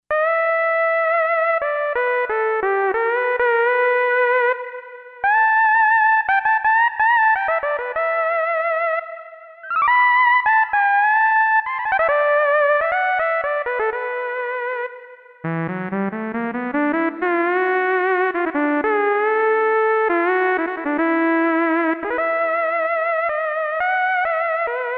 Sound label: MG SAW LEAD, Roland SC-880; Factory Patches